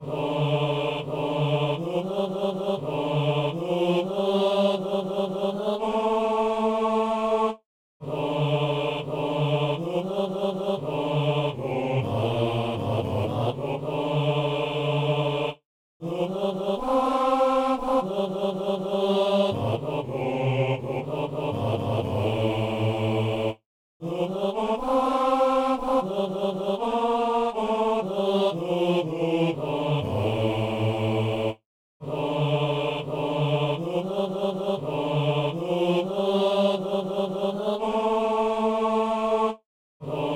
ô dieu seigneur des puissances, basse

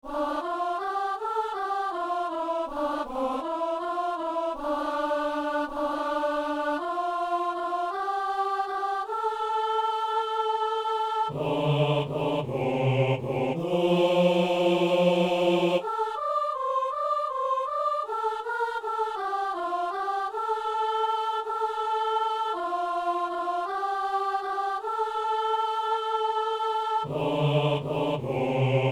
messe simple agnus soliste et basse

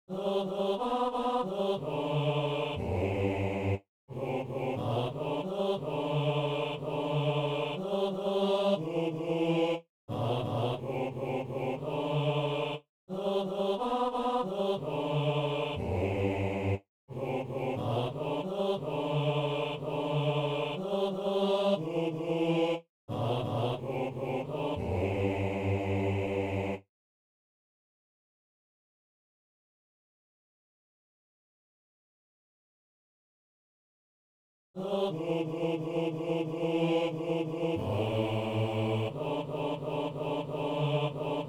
basse

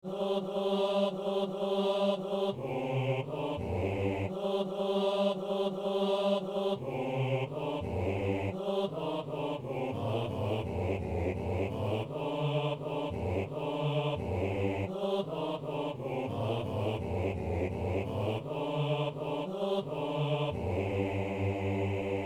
basse; Chrétiens, chantons !

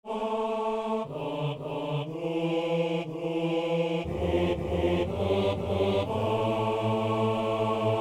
hommes

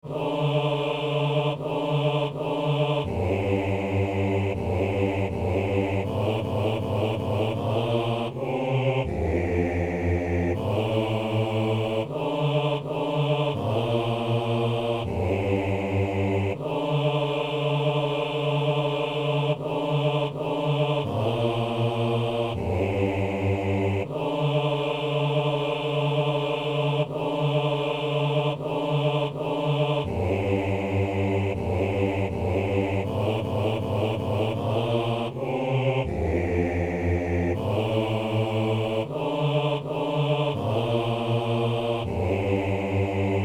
messe agnus basse; Messe inaugurale

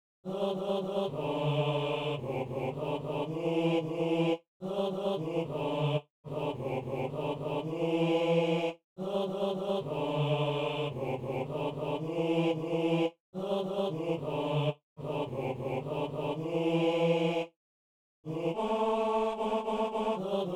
basse

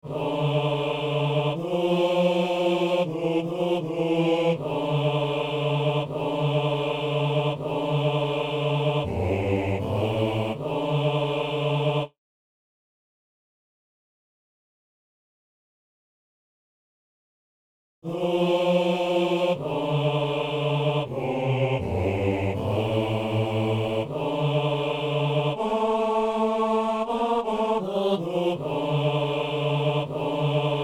basse; Messe inaugurale - Kyrie

